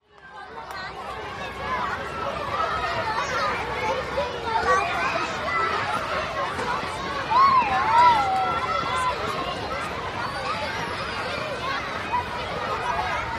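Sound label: Children Play Many Ambience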